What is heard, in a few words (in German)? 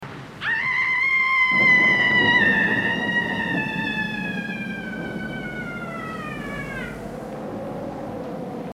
schreiende frau; Horrorgeraeusche